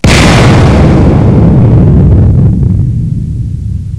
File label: big gun; freeciv